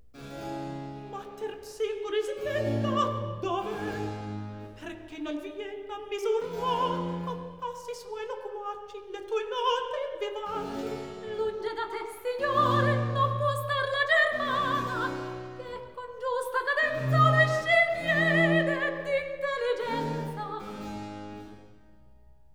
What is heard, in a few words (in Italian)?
Recitativo; Ma Terpsicore snella, dov'é; Terpsicore HWV 8b